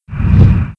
Underwater Fan On; Playable Tutorial Level

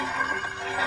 Metal Scrape Loop; Playable Tutorial Level

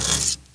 Pushables Stop Metal; Playable Tutorial Level

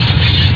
Rolling Blade; Playable Tutorial Level; TR2

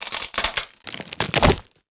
Wood Bridge Fall; Playable Tutorial Level

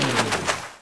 Vehicle Rubberboat Stop; Playable Tutorial Level; TR3

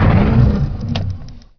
Vehicle Speedboat Stop; Playable Tutorial Level; TR2

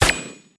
Harpoon Fire Dry; Playable Tutorial Level

